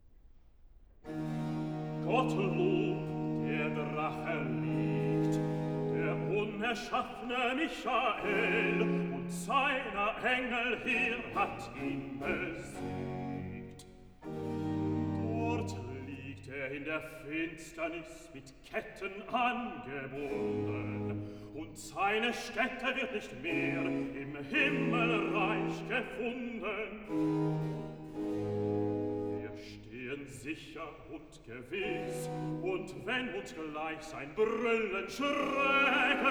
Cantata, Recitativo (Bass); BWV 19 'Es erhub sich ein Streit'; 'Gottlob! der Drache liegt'; Bach; Bremen; Feast of St Michael & All Angels

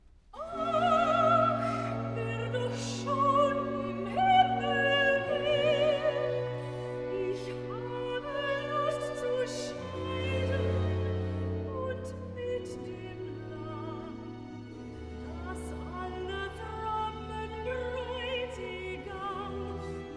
Cantata, Recitativo (Sopran); BWV 27 'Wer weiß, wie nahe mir mein Ende'; IV. Recitativo (Sopran) 'Ach, wer doch schon; Bach; 16th Sun After Trinity

